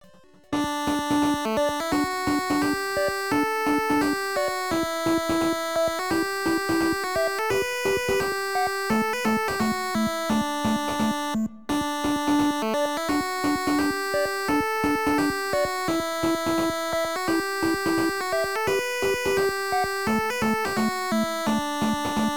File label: Baseball; Famicom Jump Hero Retsuden; Bandai